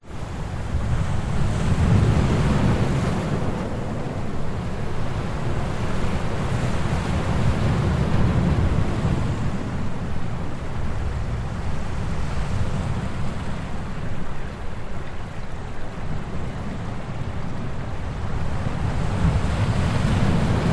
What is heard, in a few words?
Waves Medium Beach Windy Hissing Pointing at Waves Water Running Through Rocks; Spray; Skyes Audio